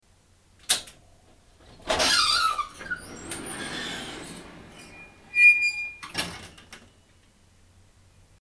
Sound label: garage door opening